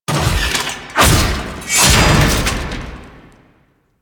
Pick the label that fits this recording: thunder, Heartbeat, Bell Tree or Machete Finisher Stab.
Machete Finisher Stab